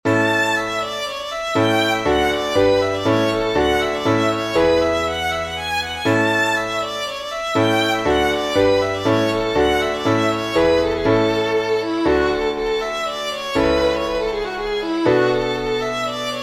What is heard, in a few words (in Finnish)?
polska